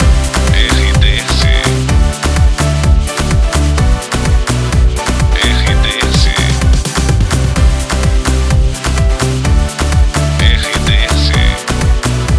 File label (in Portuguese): trilhas para locuções balada